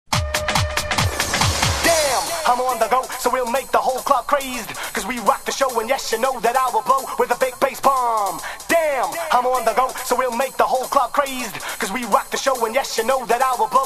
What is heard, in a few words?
acapella big bass bomb